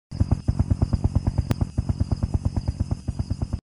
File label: Helicopter Sound; Dude Theft War; Android